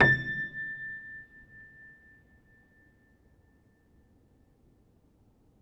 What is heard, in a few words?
Upright Piano; HSS OP Pack; healing soundscapes